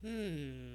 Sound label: Hmmmm